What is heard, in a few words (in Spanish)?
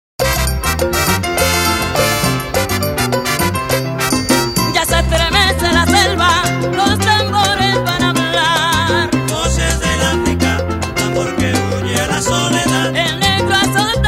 voces del africa